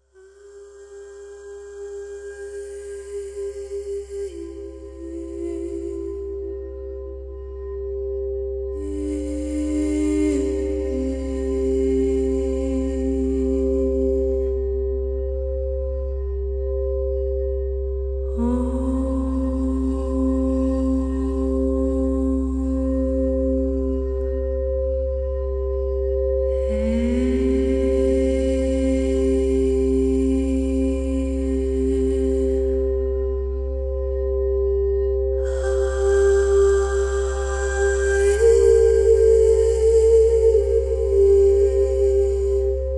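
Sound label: Crystal bowls 396 Hz 528 Hz vocals; 396htz; 528htz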